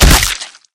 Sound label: rifle swing hit infected; source engine; left 4 dead 2; survivor